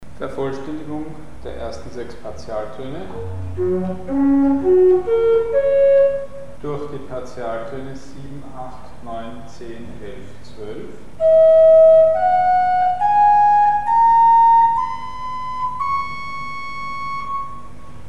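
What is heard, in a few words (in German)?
Vervollständigung der ersten Partialtöne bis zum 12ten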